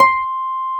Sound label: HARP C6; STRNGS; AKAI S-Series CD-ROM Sound Library VOL-8